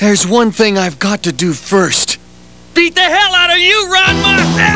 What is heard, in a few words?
beat; ranma